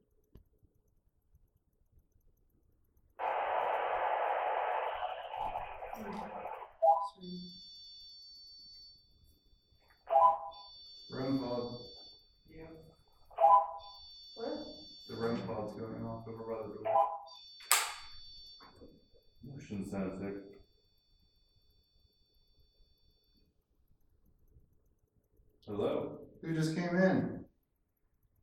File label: EVP; poasttown elementary school investigation; Mean Teacher Room